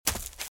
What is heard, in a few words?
Footsteps - Leaves - Run - Right; RadwinIsland